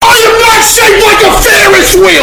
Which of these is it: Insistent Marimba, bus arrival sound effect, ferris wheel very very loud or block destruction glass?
ferris wheel very very loud